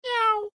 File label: Cat short